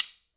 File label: STICK CLICK; Sonic Reality; Interactive Drum Kits